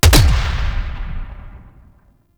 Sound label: Exalted Sniper Punch; Warframe; Frumentarius